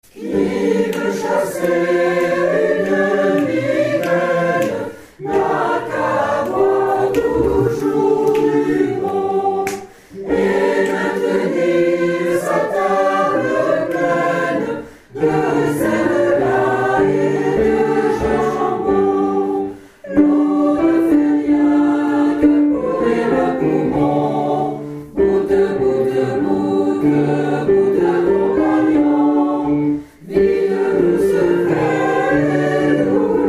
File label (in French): CHANSON A BOIRE Tutti